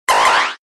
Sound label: Laser